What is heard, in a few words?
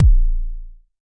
Jomox MBase, drum machine; Jomox MBase 01